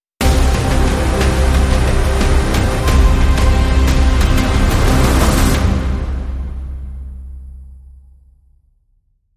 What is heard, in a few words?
Long Sting No Choir